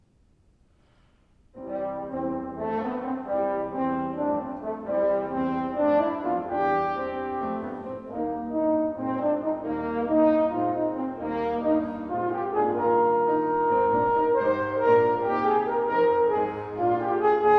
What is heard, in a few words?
Horn Sonata; sonata for horn and piano